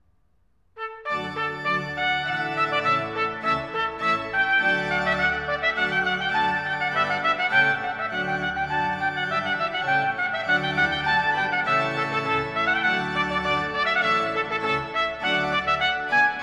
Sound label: trumpet voluntary